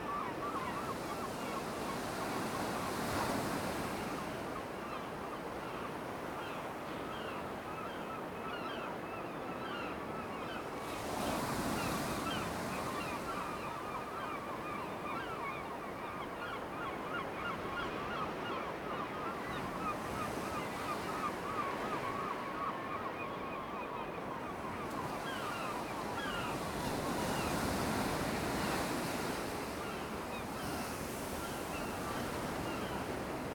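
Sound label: Kent beach morning; the 39 steps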